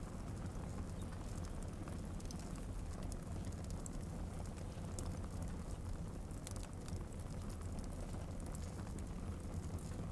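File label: London smoke interior evening fire; the 39 steps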